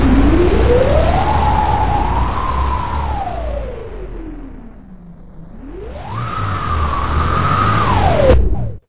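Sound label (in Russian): ветер шумный мягкий близкий приглушенный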